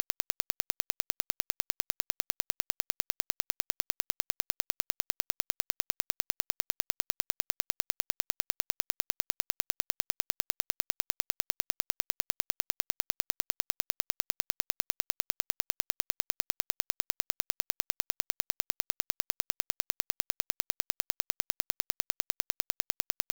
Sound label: impulses 10Hz